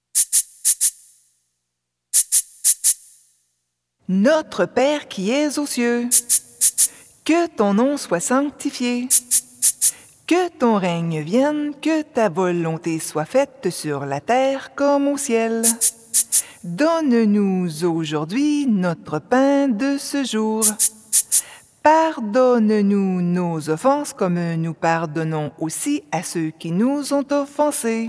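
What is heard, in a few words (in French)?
notre père rap lent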